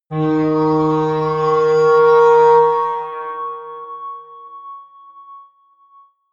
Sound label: atmospheric feedback synthesizer medium, drones